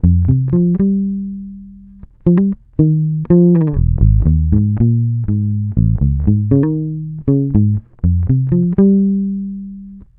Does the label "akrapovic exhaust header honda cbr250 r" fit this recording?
No